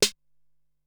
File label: Synth Snare, Drum Machines Ensoniq SQ-R-Plus; 300 Drum Machines